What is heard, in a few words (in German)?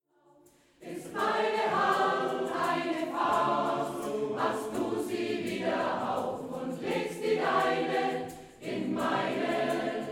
Applaus Applaus